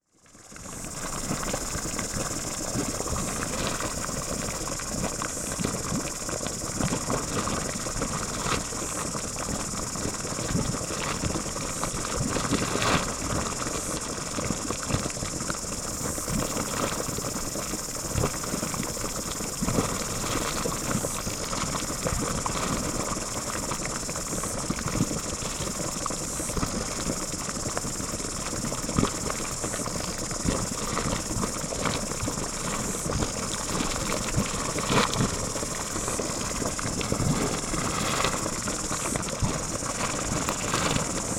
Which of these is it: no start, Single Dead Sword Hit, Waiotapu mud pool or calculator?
Waiotapu mud pool